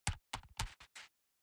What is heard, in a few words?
Cloth movment